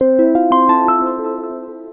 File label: ring; fldigi; alert clips